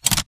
Mvmt FS Walk; AI Tick; Titanfall 2

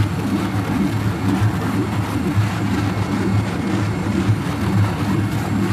generator ambient loop critical; coop; Titanfall 2

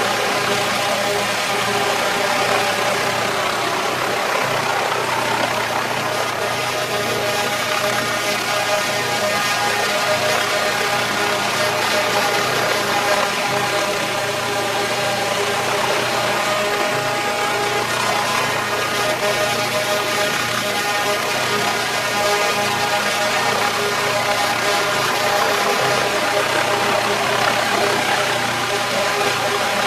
Worker Drone Hover; Titanfall 2

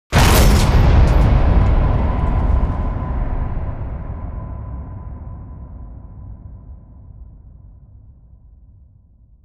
Dish Slide Scene; Beacon; Titanfall 2

